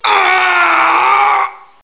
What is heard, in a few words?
military die; paranoia